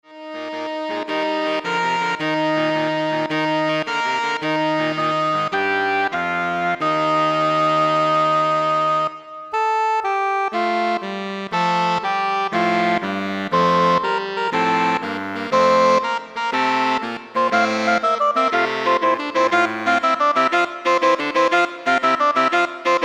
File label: Saxophone Ensemble